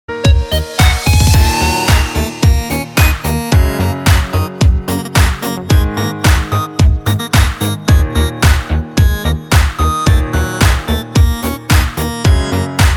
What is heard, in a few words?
DISCO BGM